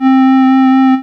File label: Casio MT-600 Clarinet C4